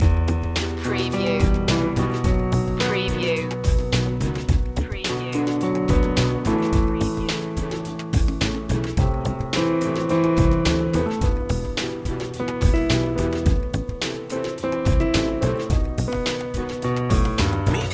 chill creole loop

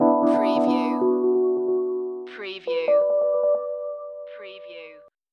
Hip Hop Dark Rhodes Licks 90 BPM Swing - E

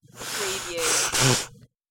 Nose Blow

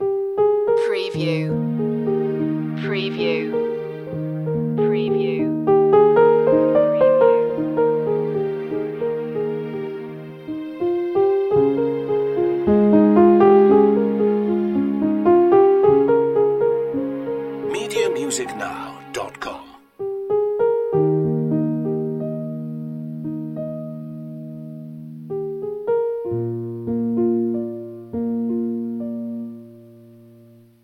gentle movement loop

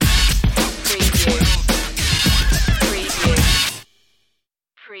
street vibin

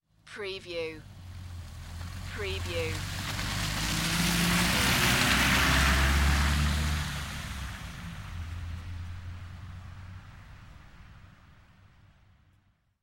Auto Pass By Snow; sound effects bible

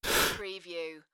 Breath In; beanstalk audio

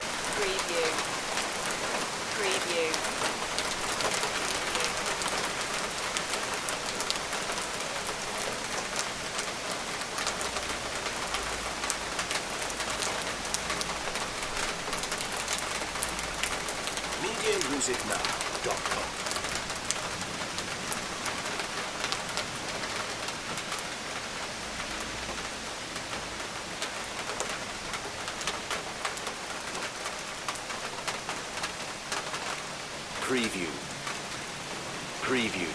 backyard rain; heavy rain and soft rain, sound of rain on window pane, drops of rain

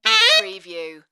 Sax Roll

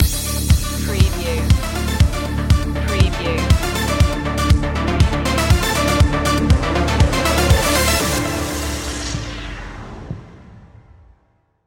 arp stinger

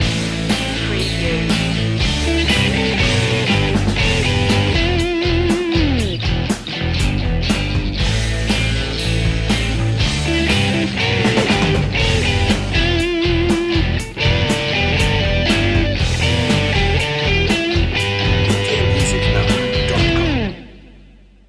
bikers bar loop

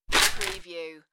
Biscuit shake; beanstalk audio

